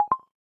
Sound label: Cable Connect